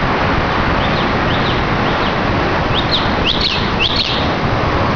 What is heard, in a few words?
Drongo, birdcall; Adrongo1